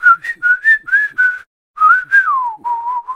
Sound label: HUMAN WHISTLE; MUSICAL; lego star wars the force awakens; ps4